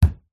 leisure ball bouncy bounce on wooden floor

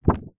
foley paper laminated sheet single short shake

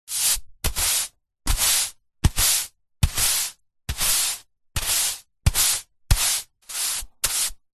household brush broom stiff sweep hard floor

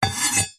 warfare knife metal blade edge scrape wood

